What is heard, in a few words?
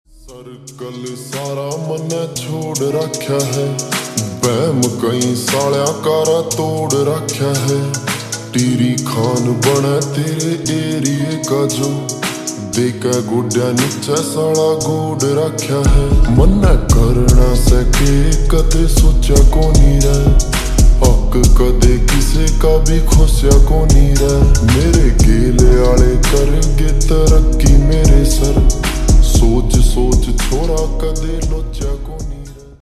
Slowed Reverb Ringtone